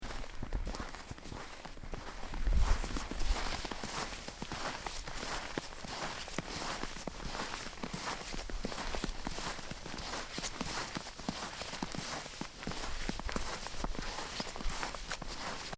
marcher sur le lac

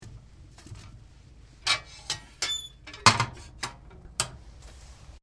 brasser le feu poele

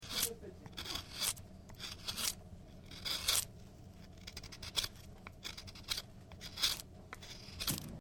couteau croche

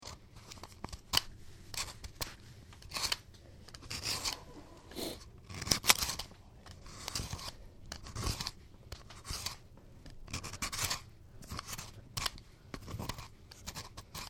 sculpter bois

